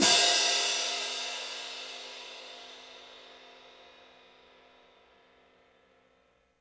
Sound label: CYM ORCH, Orch Crash; Roland LCDP03 Orchestral Perc; Orch Cymbals